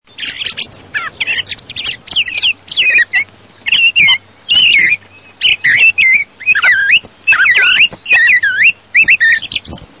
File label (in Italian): tordo